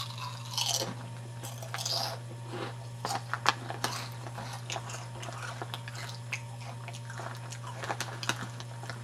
Eating Cereal

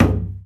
Knock

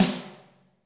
Prac Snare

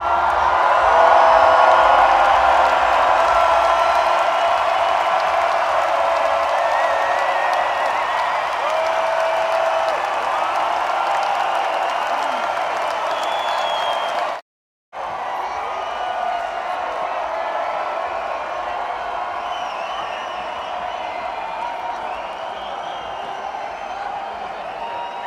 Crowd After Encore

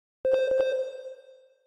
Beep Beep

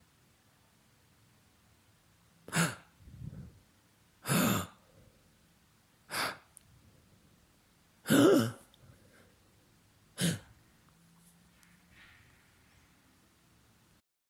Male Gasping

